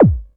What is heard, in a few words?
kicks, drum machines, FutureRetro FR777